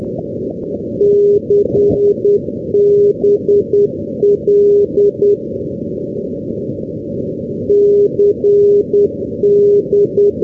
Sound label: ndb campbelltown; europe